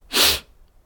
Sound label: catching a cold; human